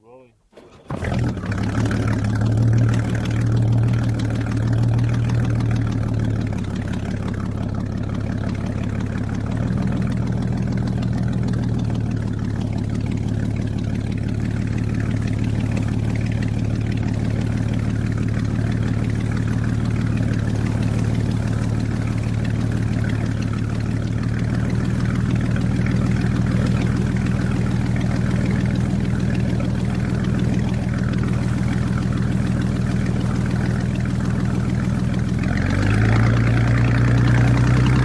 V6 Chris Craft Strt, boat engine

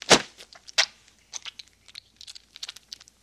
Knife Swish Blood Sq, knives

